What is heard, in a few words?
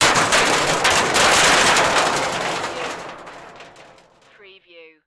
Crash Metal Debris